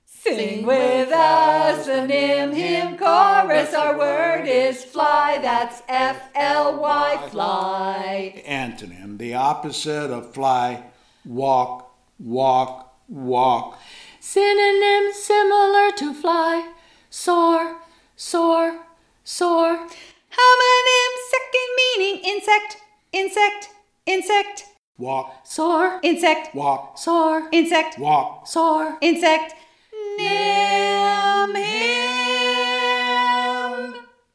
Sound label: Chorus Fly